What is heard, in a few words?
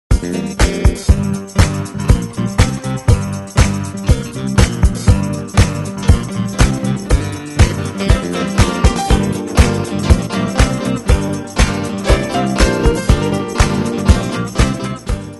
classic disco philly